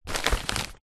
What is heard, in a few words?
parcel large padded envelope movement; foley